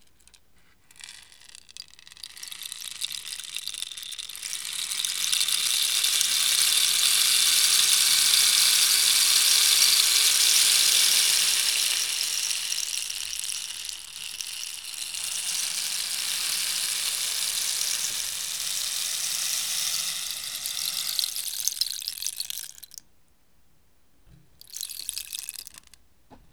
rainmaker studio high quality recording, Recorded in a professional studio; water drop; Recorded in a professional studio with a Tascam DR 40 linear PCM recorder